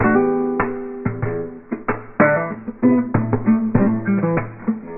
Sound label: guitar trouble brews; Loops; Phonky